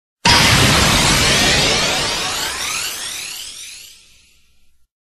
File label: Pokeball open